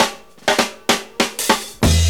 DRMROLL; E-Lab; X Static Goldmine 2; akai

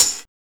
TMB

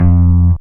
BAS TON; E-Lab; X Static Goldmine 2; akai

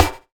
SNARE; E-Lab; X Static Goldmine 2; akai

